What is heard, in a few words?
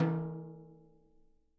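edge mp, Peter Erskine Living Drums; Sampleheads; JZ XTOM 1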